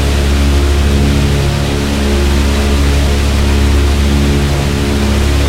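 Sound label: b17 cockpit left, engines; War Thunder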